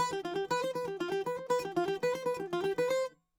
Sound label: bouzouki